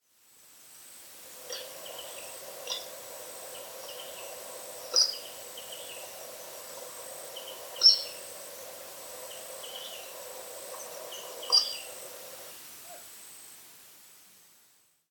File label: Blue coua; پرنده کوآی آبی